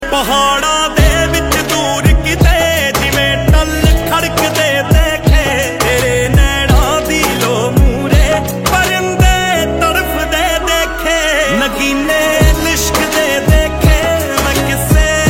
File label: Ringtone